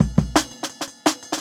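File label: Jungle Breaks, 170 bpm; Slop; Original Jungle Breaks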